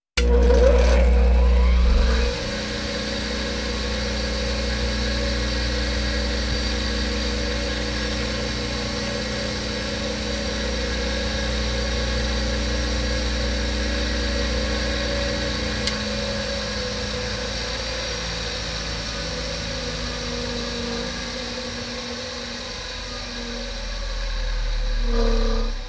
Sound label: Walking Machinery Trucks